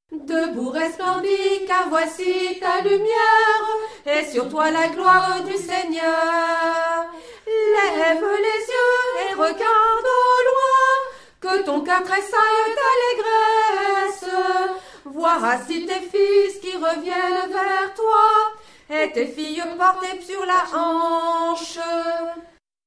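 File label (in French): Debout Resplendis Tenor, chœur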